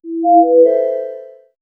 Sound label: notifications chime synth